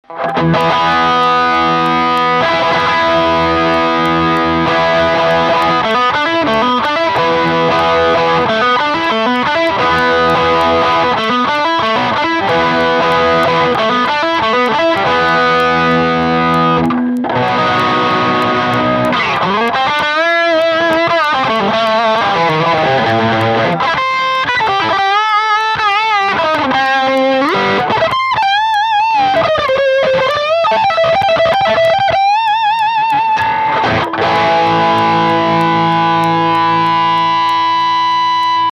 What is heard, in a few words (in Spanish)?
Guitarra desconocida